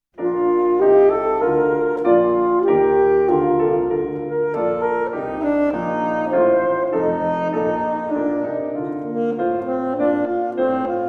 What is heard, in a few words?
Cantilena; G. F. Handel; grade 2